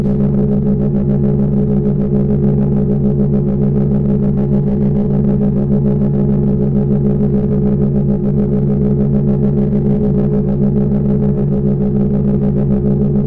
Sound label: Stereo Futuristic Machine Sound